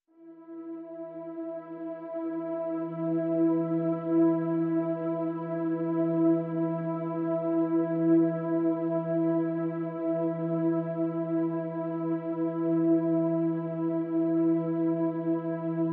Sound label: Pads Ethereal Mist (E)